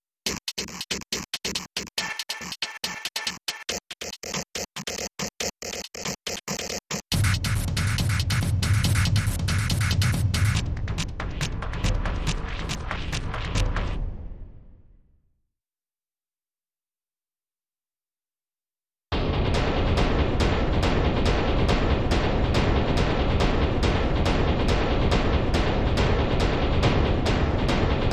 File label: Glitch Kit